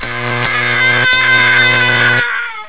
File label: shock person